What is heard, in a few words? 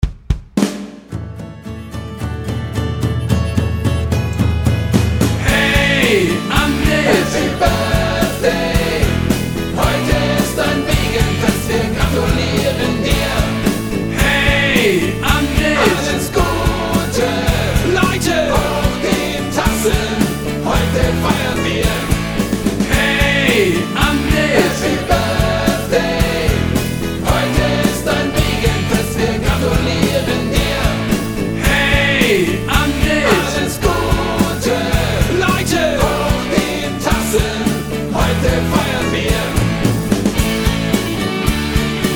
Happy Birthday Angret